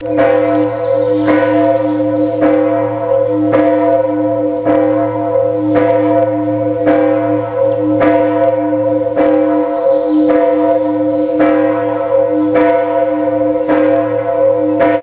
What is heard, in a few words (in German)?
Hersfeld lullusglocke